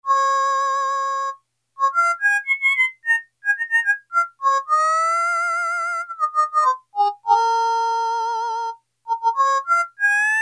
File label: HARMONICA, Yamaha DX7